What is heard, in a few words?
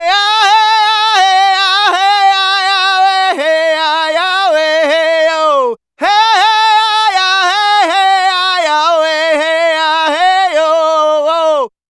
Native Vocals, Native American